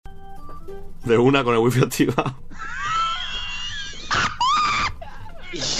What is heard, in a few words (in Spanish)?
la risa; lmdshow